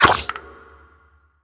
vent enter, headcrab classic; headcrab classic, scripted; creatures

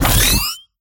stunner activate; kidnap; scripted sequences; alyx

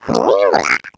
egg bot tractor beam; player; Portal 2

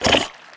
breath in, headcrab black; creatures

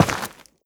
step, footsteps, gravel; player

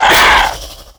attack, antlion; creatures; vox

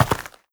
step, footsteps, player, dirt

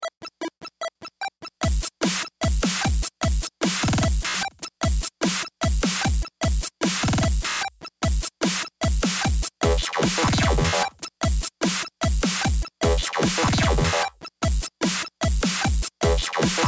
bomb planted; music

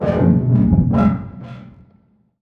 strider vox general; creatures

